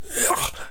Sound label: zombie crawler swipe; Hanuman Return